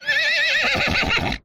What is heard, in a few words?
horse cry